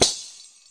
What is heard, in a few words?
arabic perc, Arabic Percussion Kit